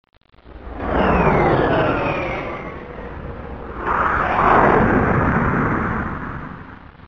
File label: War Plane